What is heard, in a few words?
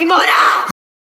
echo fast